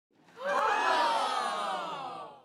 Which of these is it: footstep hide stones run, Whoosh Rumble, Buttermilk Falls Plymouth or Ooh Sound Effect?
Ooh Sound Effect